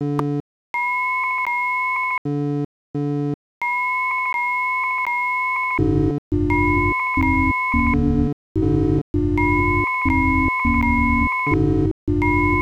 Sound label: Sinewave